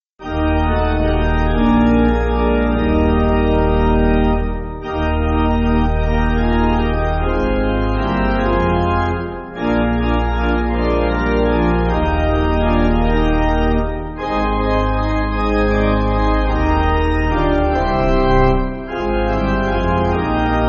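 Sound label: Pipe Organ, Music Backing; Christian Home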